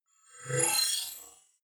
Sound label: flyby splutter